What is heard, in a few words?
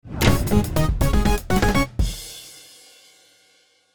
you win sequence; book of ra